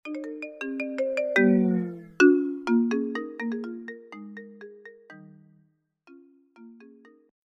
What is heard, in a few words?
doppler shifted ringtones ios